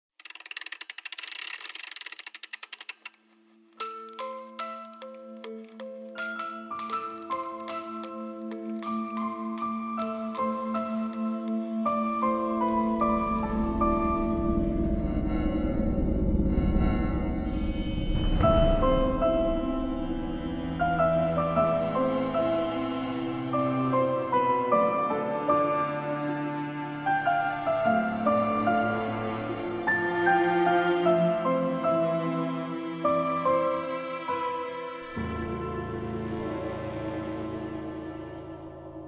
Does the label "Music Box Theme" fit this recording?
Yes